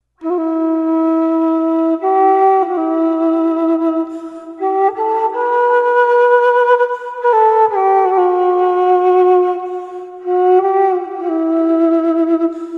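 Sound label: beautiful melody of flute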